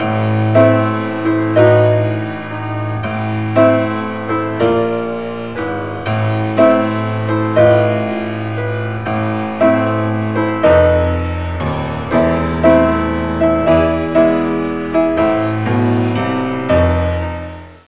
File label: piano, halloween